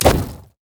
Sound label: sad mummy bandage toss; LoL; amumu